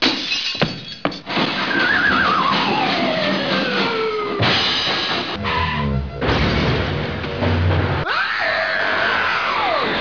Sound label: Crash Montage